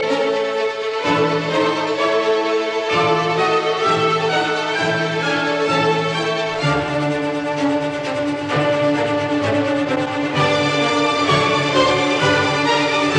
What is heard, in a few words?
Piano Concerto in D minor Rondo Allegro assai; Mozart